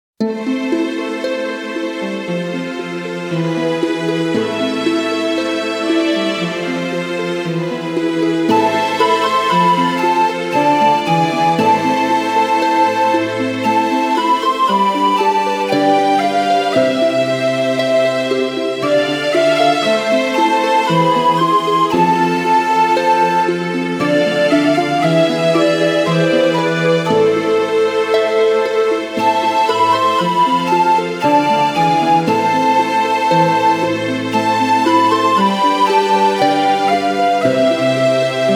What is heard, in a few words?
Backing